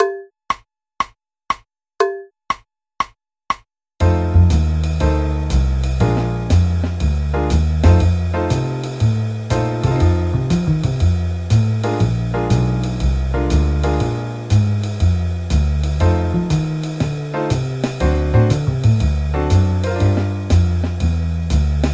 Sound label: F Blues 120 BPM